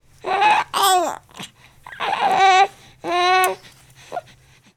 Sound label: Gurgle cry